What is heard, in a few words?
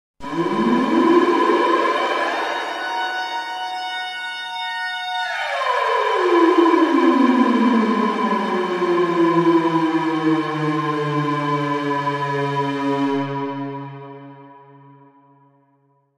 Outer Space Alarm